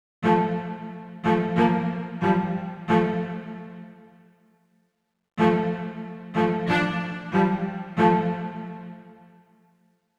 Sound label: Old cello melody C; Tracks Toybox Edition